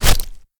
Swamp Jumper Hit By Axe; We Are the Dwarves

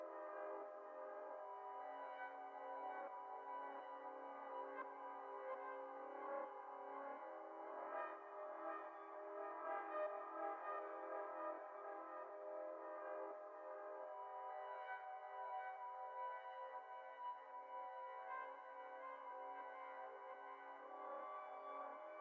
Church

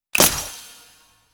Icy Touch; lego ninjago rise of the snakes

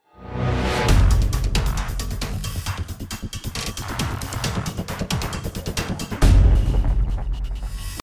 Action Street; The Amazing Spider-Man 2

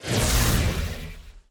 Energy Orb

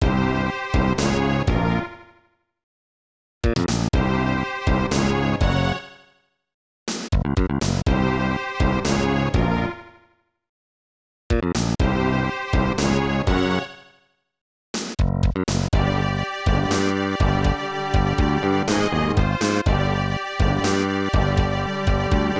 Tension; Metal Max 2; snes

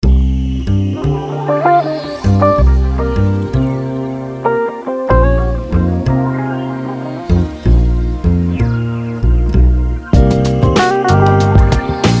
Lounge; Hollywood Files Deadly Intrigues; NDS

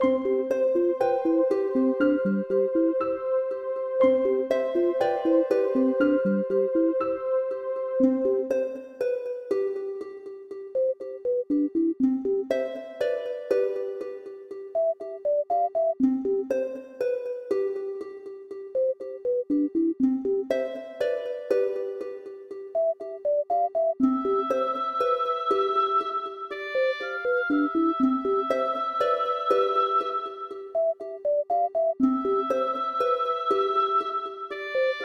Main Music; Plants vs. Zombies

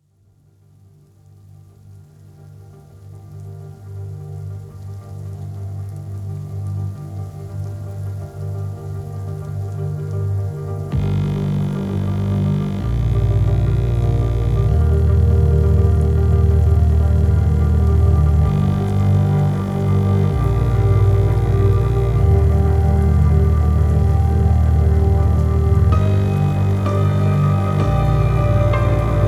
Rainfall; Dual Randomness; Girls Frontline major event soundtrack 9; android ios mobile online gamerip 2020

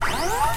Bot Roll Start

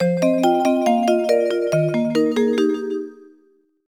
Jingle Results; Harvest Moon: Frantic Farming; NDS

